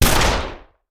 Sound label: Shotgun; Sound Ideas; Guns